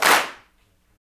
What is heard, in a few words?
CLAPS, Africa Handclaps; Roland LCDP14 Africa VOL-2; Afr. Hands